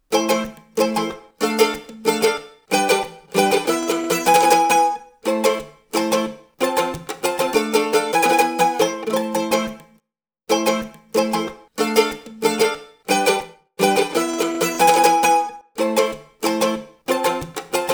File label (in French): ballet mandoline